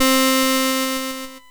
1980s Casio Harpsichord C5

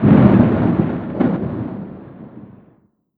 Kawai K5000W Thunder

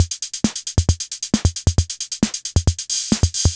Casio MT-45 16 Beat

